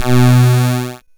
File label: Drums, Hits; DCM8